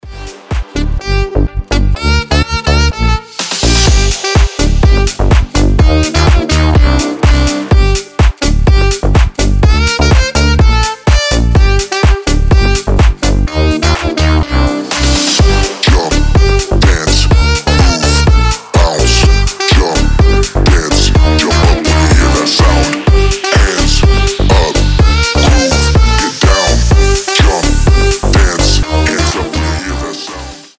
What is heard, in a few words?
jump